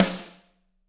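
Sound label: snare drum; Bob Clearmountain Drums I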